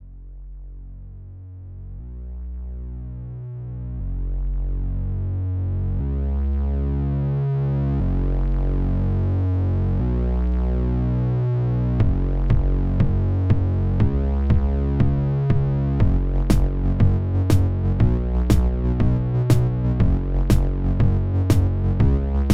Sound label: pulse drums